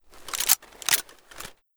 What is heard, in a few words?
rgm40 holster, weapons